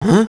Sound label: humm; BattleSNDS